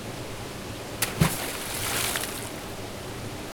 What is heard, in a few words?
Waterfall Throwing rock into water